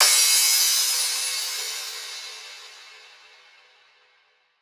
Crash

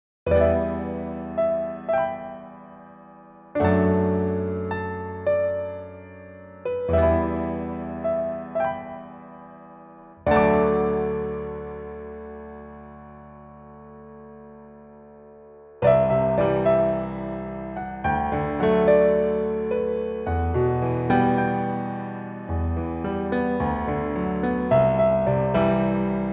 Piano Ver.

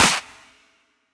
Clap

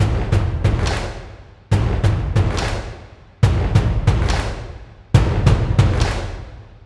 Cinematic Drums 140BPM

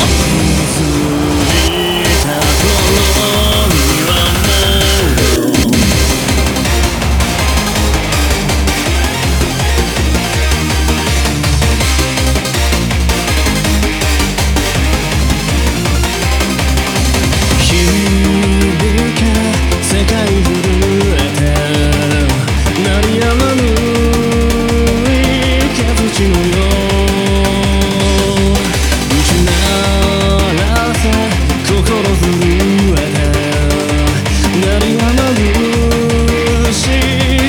Beat, EUROBEAT

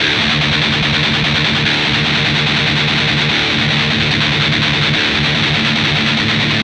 Guitar

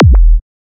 Kick

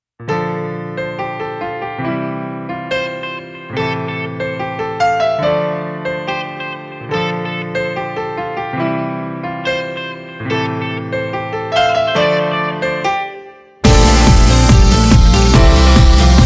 Japanese Disco(Instrumental)

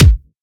Kick

